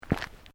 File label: Footsteps Sneakers Road Step; Footstep Foley; Footsteps (Sound Effects:Human)